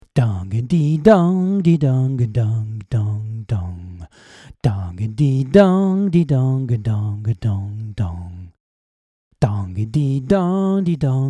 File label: Vox